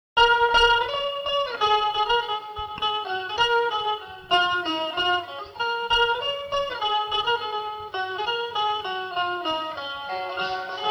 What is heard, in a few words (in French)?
polka solo, epinette